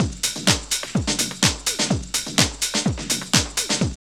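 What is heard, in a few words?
drum loop; X-Static Goldmine 3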